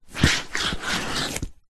foley footstep boot movement shuffle wet ground puddles